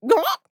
Mage Death; Realm Royale; Radon Flux

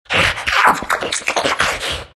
lipsmack; Undying

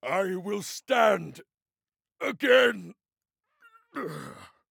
Death; Khan

